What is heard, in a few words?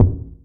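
bass drum, left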